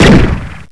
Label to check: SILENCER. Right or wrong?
Right